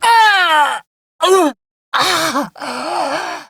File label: Death; Warframe; Corpus Lich; Persona A